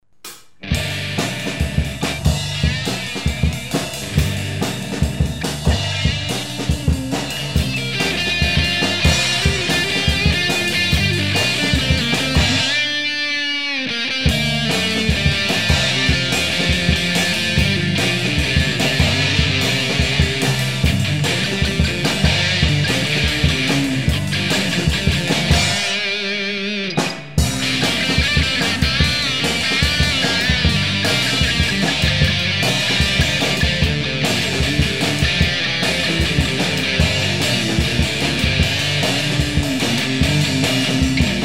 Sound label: Happy Blues